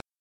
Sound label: Instrument